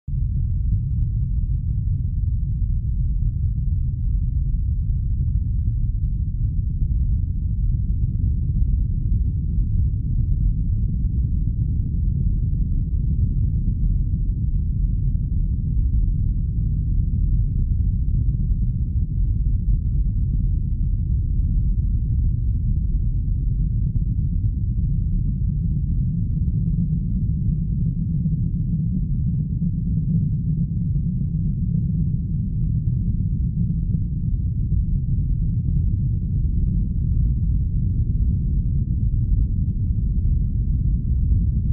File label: Low Freq Earthqk Rumb, rumble